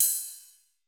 ride, Roland MC-909

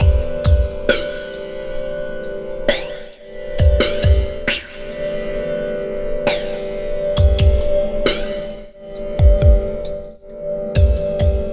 AMB DRN, AMBI DRM DRONE; Zero G; Malice In Wonderland

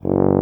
BRS TUBA, Solo Instruments

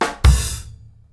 KICK SNAR, Live Snares

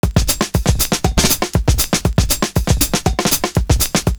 BLOCK BEAT, Kitch 70s, 119 LOOP; Univers Sons

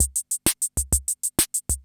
CR78

